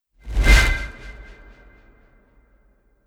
Frozen Blight; Enchant

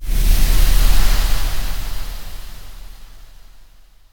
Pandora Vapor

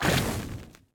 Lavadamage; Likho

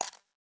Generic UI

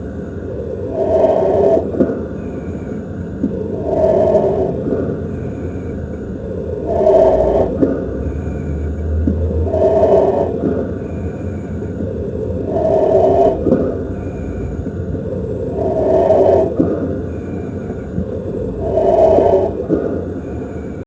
Amb Spacesuit

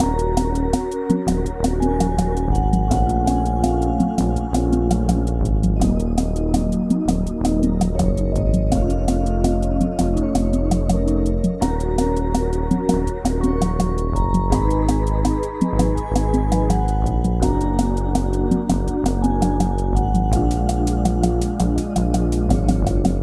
Elevator Loop; gamerip; dungeon of the endless; DotE Elevator Loop

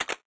Button Select

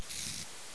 Worm Walk; Knight Online

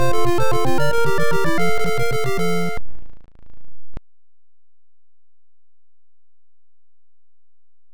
Match Over; battle battle league; FDS

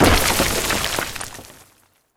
Sarco Horus Eye Explo

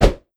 DROPS; Legend of Keepers

